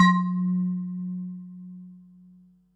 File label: HH, Pearl SC-40 Hihats and cymbals